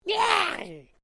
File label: Created Creature Small Call, Alien Sound Effects